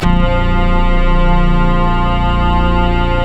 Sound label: ORGAN, A#; Big Fish Audio; Synclav FM